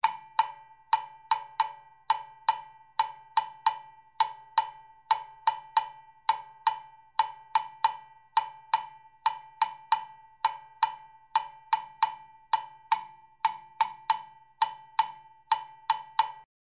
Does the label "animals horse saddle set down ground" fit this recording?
No